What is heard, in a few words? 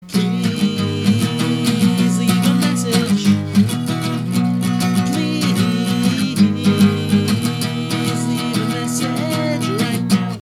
leave a message (acoustic); please / leave a message / please / leave a message / right now!